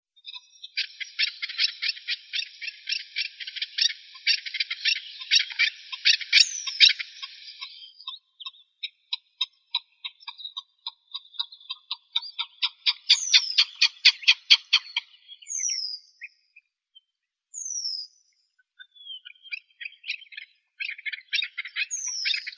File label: chukar, Alectoris chukar; keklik